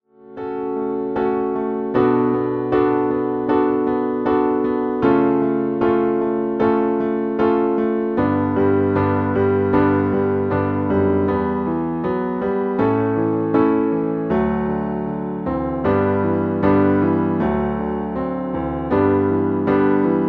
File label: singletrack piano